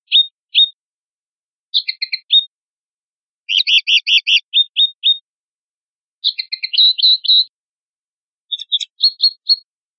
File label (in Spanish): pinzonada; pardillo